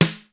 lo tite sn; sr16 sd

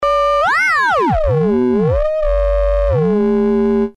ring tune; nord

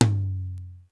md tom dry; sr16 tom

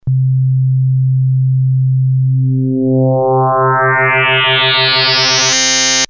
osc1 fm; nord3

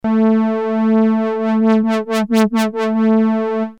lfo flt; nord

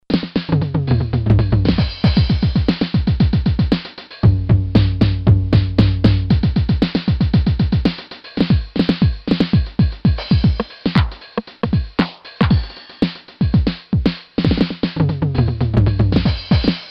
tr909

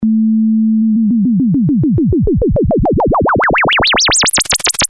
fm up; nord

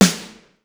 room snr; sr16 sd

